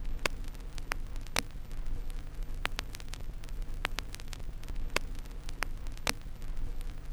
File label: Crackle, Vinyl Noise